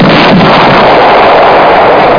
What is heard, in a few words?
Big Explo; PlanetFall